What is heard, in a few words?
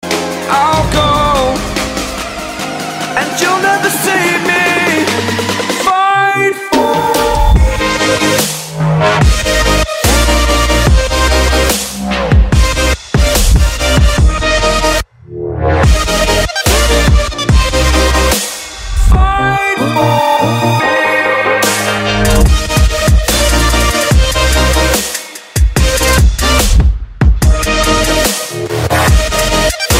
Rival Trap